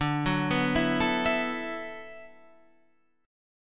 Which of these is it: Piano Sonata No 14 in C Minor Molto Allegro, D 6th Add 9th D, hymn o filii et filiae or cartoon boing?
D 6th Add 9th D